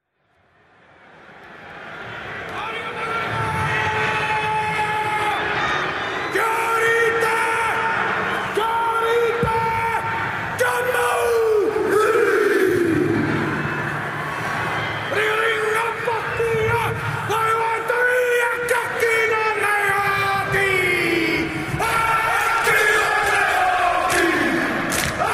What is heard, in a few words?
Haka; All Blacks